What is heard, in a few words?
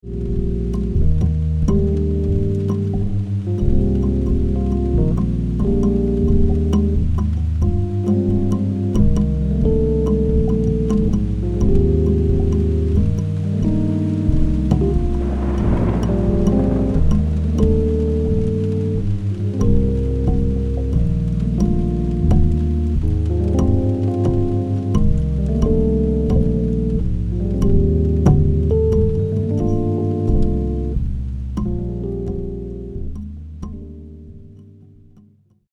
drops